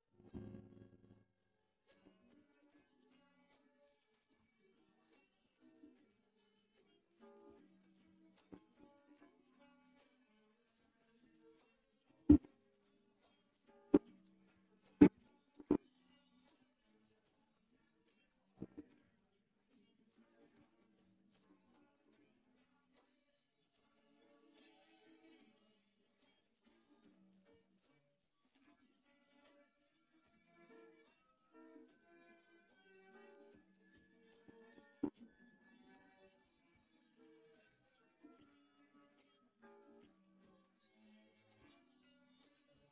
piano

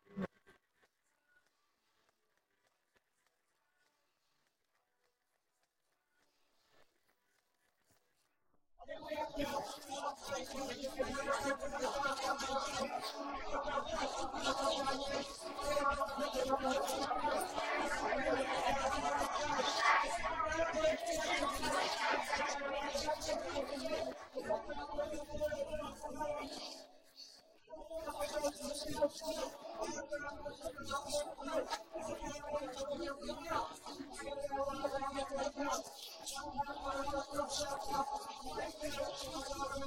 vocals noise

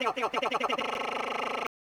fill

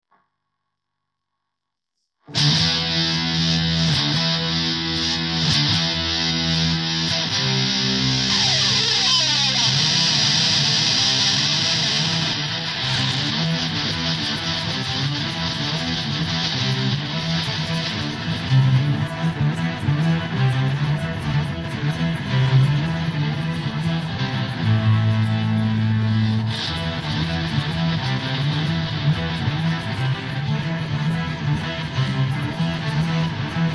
guitar

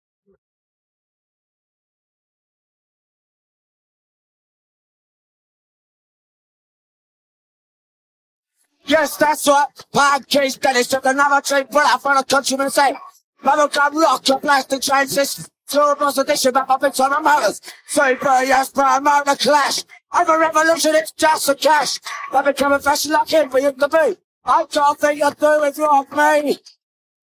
vocals

